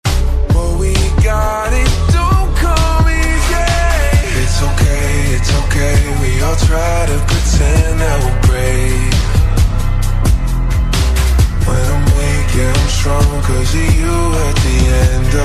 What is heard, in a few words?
english ringtone